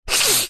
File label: human sniff hard blocked nose; zapsplat